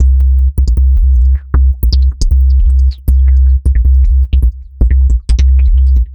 Synth Bass

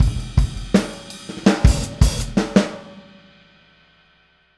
Boogaloo - Outro - Full Mix, 82 BPM; Sonic Foundry; Drums from the Big Room The Mixes

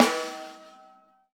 RAW SD, Drums Acoustic; AKAI; 90s sample CD; USB Soundscan vol.10; RAW KIT 3

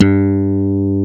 funk mid G2, digital bass; Music Store - Claudius Diemeter Schwerlos

